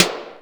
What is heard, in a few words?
XD5 Snare, Kawai Drums